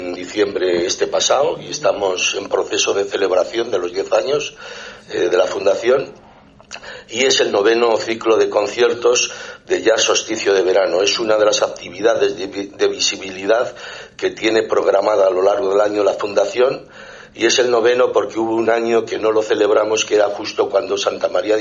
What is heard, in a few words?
jazz